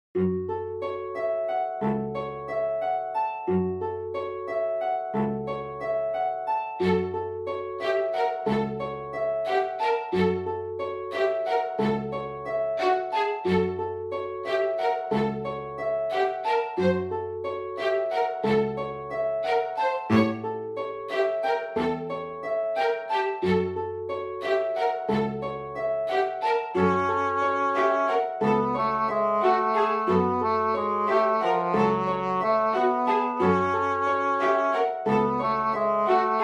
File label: static title loop